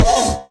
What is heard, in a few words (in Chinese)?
骷髅马受伤声